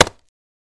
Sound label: Staff Wood Hit Low